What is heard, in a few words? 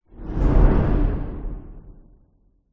whoosh; orlando lanzini